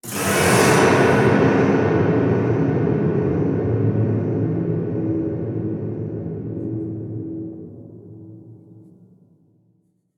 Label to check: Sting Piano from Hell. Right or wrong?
Right